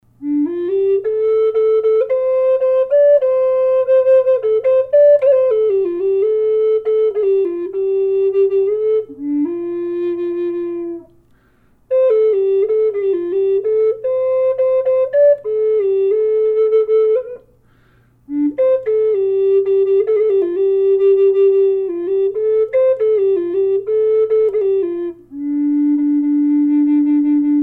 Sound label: low d wolf, flutes; collector flutes